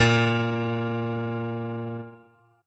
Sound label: piano; pianojs